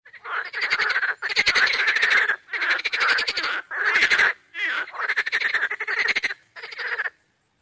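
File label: croaking of frogs funny sound of croaking frogs; kvakane-lyagushek-zabavnyy-zvuk-kvakayuschih-lyagushek-vozle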